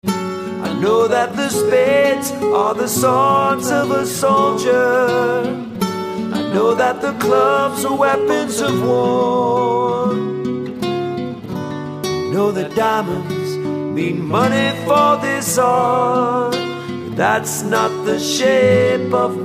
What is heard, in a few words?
Dance Band Acoustic Mix; Sacramento